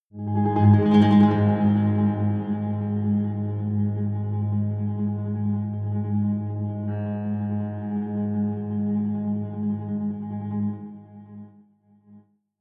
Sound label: rattling piano drone, drones